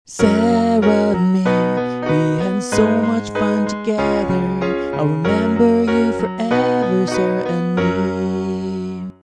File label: piano